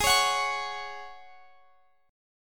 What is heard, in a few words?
G sharp 7th Suspended 4th, Guitar, Standard; strummed; capo 9; chordshape; x,x,18,18,19,21